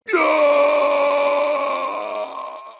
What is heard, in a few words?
scream; mk9; shao kahn